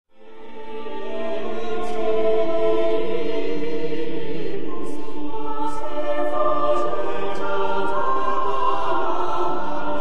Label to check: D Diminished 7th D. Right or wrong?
Wrong